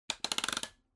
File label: Electric Fan Height